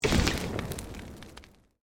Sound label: Created Magic Spell Fireball Impact